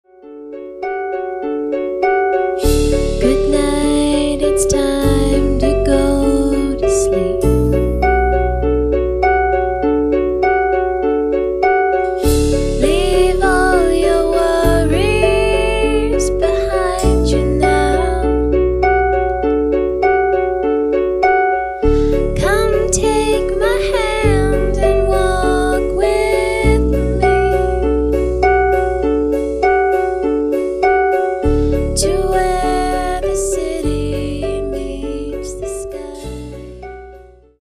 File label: Lullaby